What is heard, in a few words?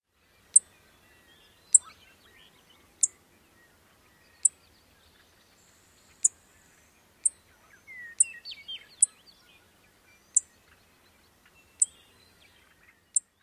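Clay-colored Sparrow calls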